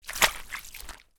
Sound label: foley footsteps in wet mud puddle